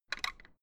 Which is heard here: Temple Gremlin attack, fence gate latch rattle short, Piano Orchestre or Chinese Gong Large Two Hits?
fence gate latch rattle short